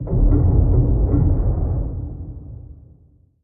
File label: submarines engines